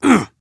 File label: Assassin Grunt Fast; Anarchist; Realm Royale